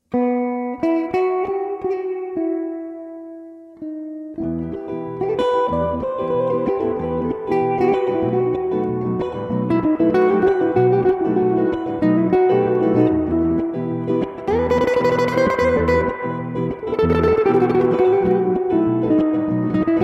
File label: Rumba